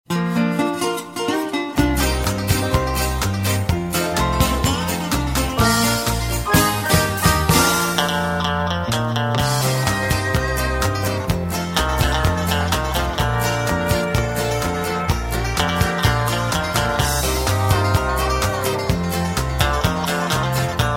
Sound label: harmony